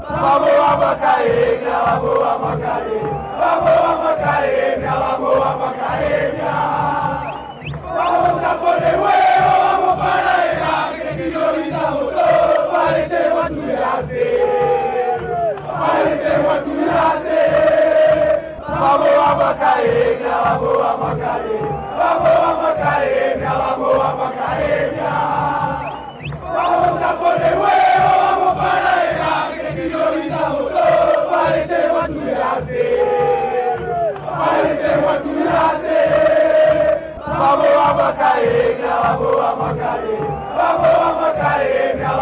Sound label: if not well scream, fanchants, argentina